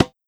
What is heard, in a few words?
Perc Tabla Te